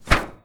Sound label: shield hit; Combat